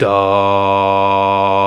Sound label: VOX, Male Scats; 90s Sample CDs; Club-50 - Foundations Roland